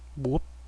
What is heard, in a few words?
pickup health; twitch-1.0